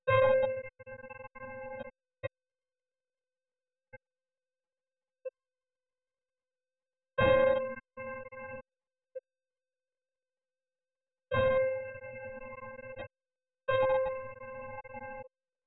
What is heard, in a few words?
piano 1 layer